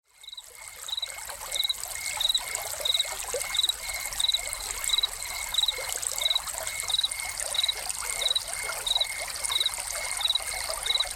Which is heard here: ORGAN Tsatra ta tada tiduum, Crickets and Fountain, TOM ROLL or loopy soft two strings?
Crickets and Fountain